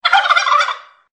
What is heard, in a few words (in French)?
Dindon glouglou